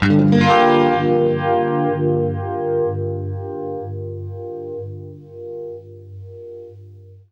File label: UNIVIB GM7, guitar; East West; Steve Stevens Guitar Samples Collection